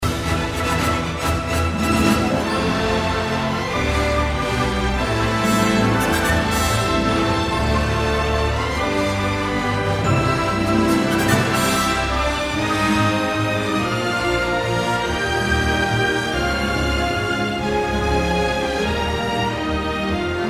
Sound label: Solo